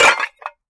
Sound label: pot smash; Scrat